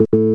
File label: wrong buzzer; N64; YOSHISISLAND